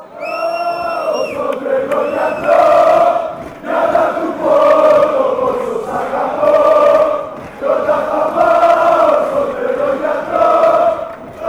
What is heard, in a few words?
paok fc chant, fanchants